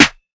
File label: Snare Dark, Hip Hop; drums; STYLE KITS (Hip Hop, Etc); Khezie Hip Hop Kit